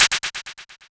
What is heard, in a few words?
Snare, techno drum